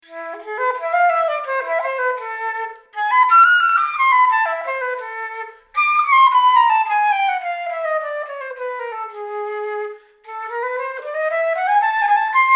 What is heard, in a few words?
nuvo flute fantasy